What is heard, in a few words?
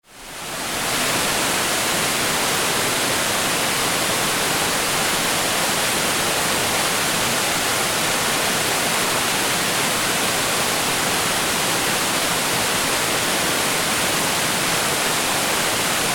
tannery creek falls; naples